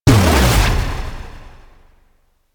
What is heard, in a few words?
UI Gift Unwrap; DE; Warframe; Gifts